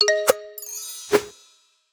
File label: Animation Clicking Button